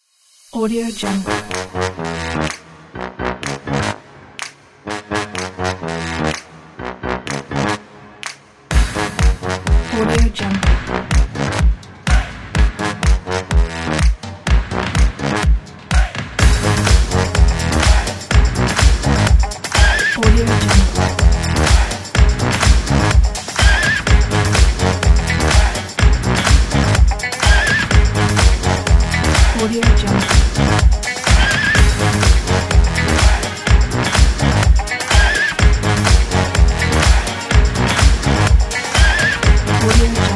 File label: modern background kit